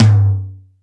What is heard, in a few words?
Low Tom